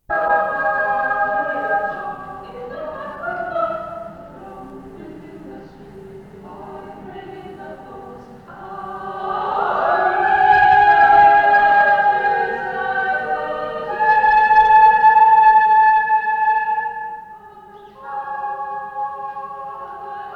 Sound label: Birdsongs Dress Rehearsal